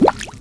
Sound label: boss bubbles